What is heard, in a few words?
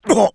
pain sharp, fr; Engineer